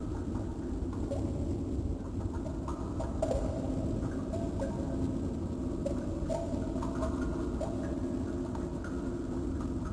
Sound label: water